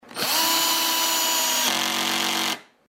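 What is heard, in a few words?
drill driver clutch; drill drvr; chuck; drl drvr clutch new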